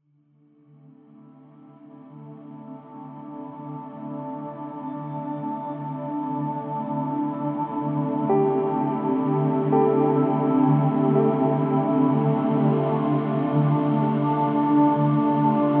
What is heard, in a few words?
Dream Incubation Waves; Frequency Booster Audios; AstralHQ